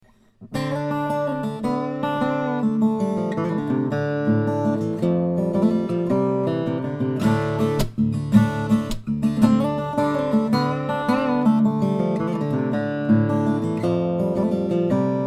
new world, steel string flattop guitar; sobell; brazilian; german spruce

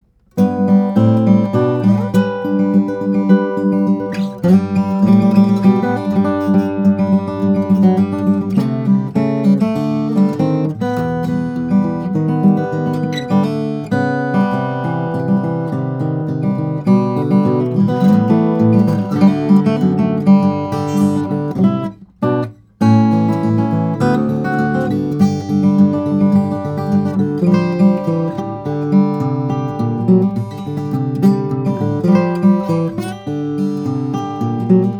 OM, steel string flattop guitar; santa cruz; cocobolo; redwood

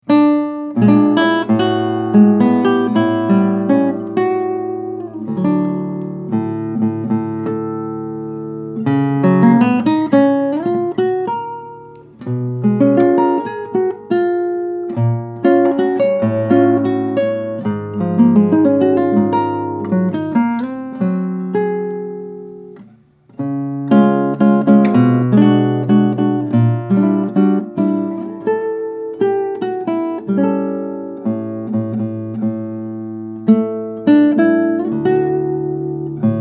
Unibody, Padauk/Carpathian, classical guitar; New Ennis Unibody Padauk/Carpathian; Anthony Ennis‘s 2015 Unibody

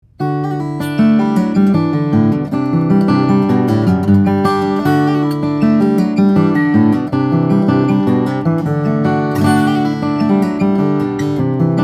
Bourgeois OMC, steel string guitars, flattop; bourgeois oms le; brazilian rosewood adirondack spruce

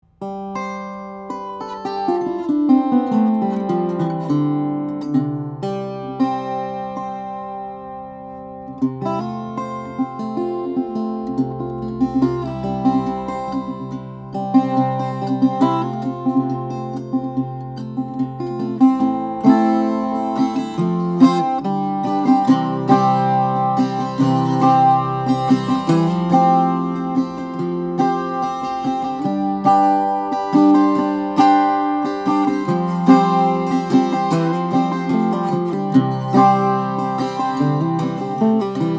guitars; maple; spruce; steel string guitars; flattop; Veillette Elan

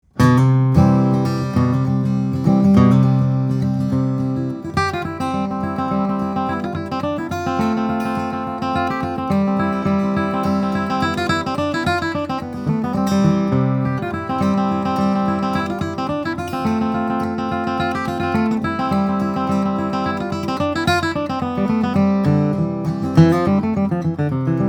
Martin D-18, steel string guitars flattop; 1939; mahogany adirondack spruce

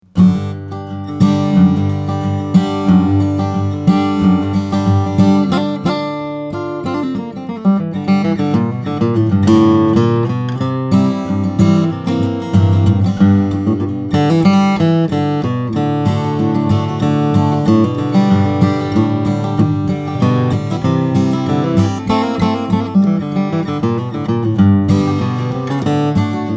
haruo, steel-string flattop guitar; haruo guitars

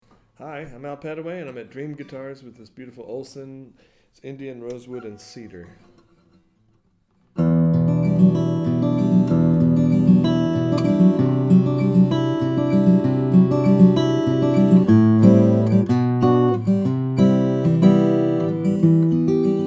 steel string guitars, flattop; olson sj small jumbo

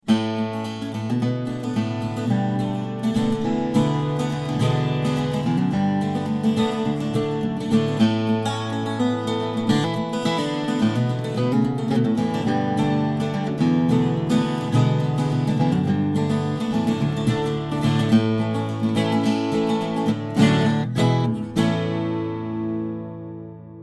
baritone 12 string, steel string guitars, flattop; veillette

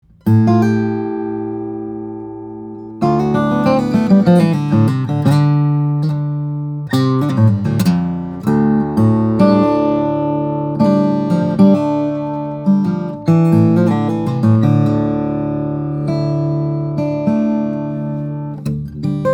steel string guitars flattop; petros; applecreek fs custom; walnut; cedar

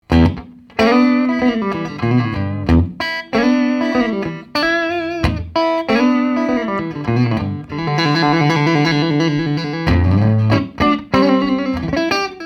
fender stratocaster, electric guitar solid body

